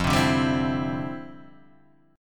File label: D Minor 11th F